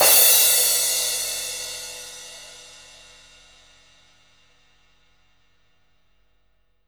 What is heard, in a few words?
crash cymbal ff, drum kit; unpitched percussion